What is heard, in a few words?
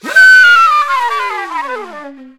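flutter, flute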